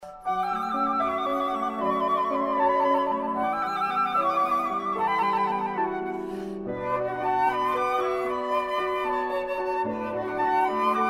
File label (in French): flute